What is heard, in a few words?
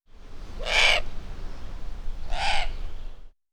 Owl squawks; Booboo